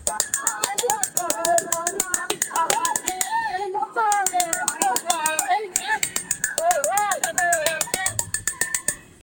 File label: african village tribal